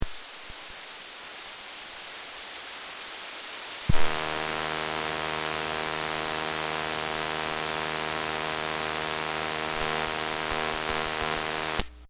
Cobra Handheld Cb Static Snow, Ham radio white noise; Ham radio white noise with electric buzzing